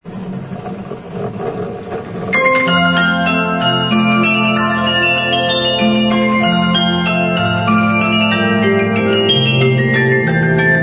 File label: Grand Console Music Box; 1904 Mira 18.5